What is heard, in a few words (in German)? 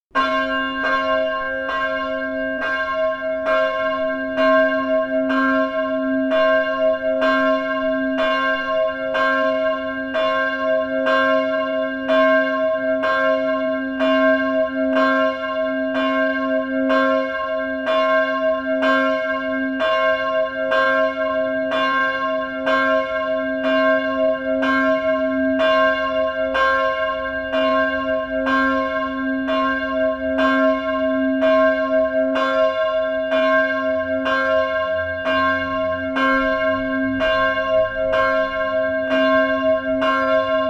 ton auferstehungsglocke